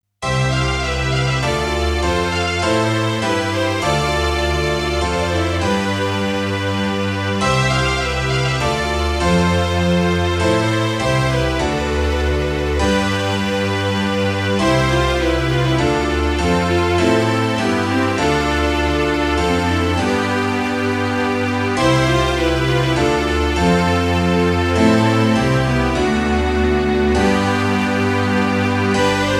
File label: baroque